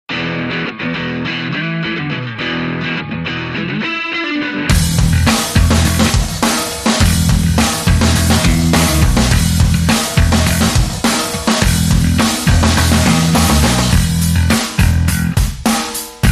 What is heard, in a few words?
Electric Strings Short Rhythm